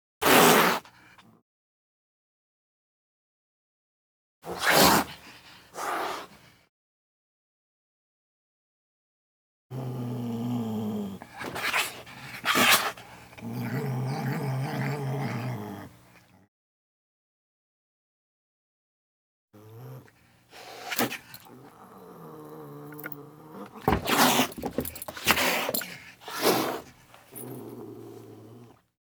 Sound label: Animals Birds; Sound Ideas 6003